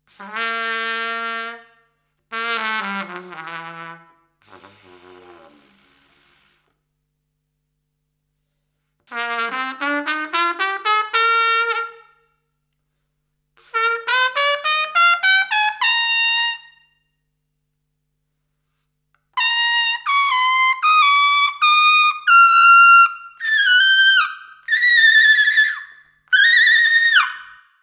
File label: yamaha em1 high note